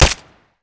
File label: glock17 close silenced; Escape from Tarkov